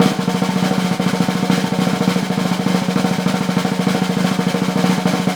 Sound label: SNR, Synclavier Percussion 2 - World & Orchestral